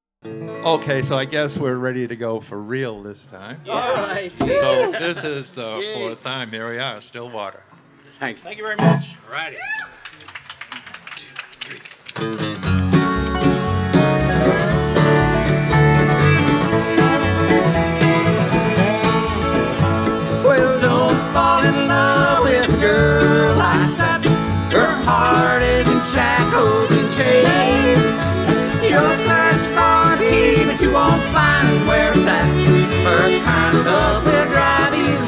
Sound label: Bluegrass Band; Rainier Pickin Party 2018